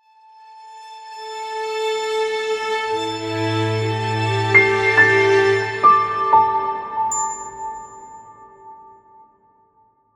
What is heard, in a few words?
Intro Logo